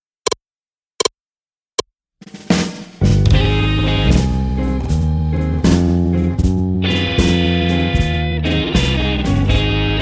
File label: jump blues in ab complete slow